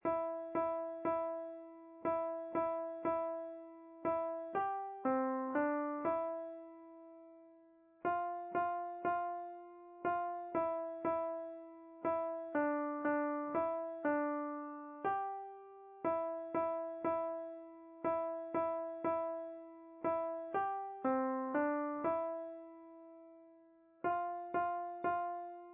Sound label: Jingle Bells